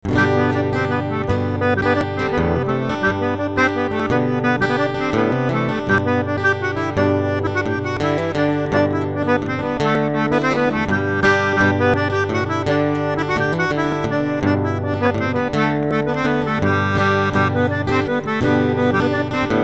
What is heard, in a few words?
Gavotte; double